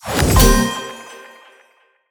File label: pick n click; netent